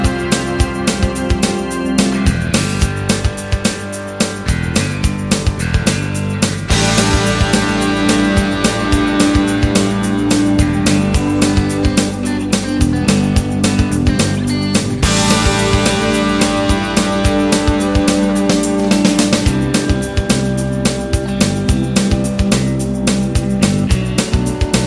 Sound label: instrumental